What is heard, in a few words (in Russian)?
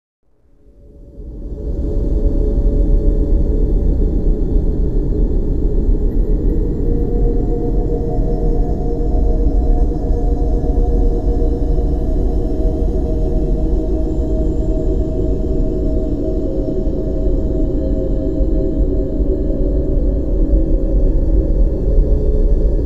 kosmicheskij zvuk zemli